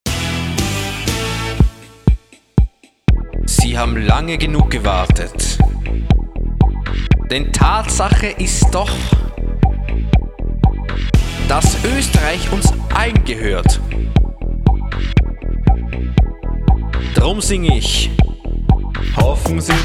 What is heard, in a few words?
mikunda elektropop jingle